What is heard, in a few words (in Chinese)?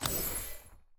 放下球员卡牌; nba; 编队